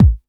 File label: kik, Bass Drum Kicks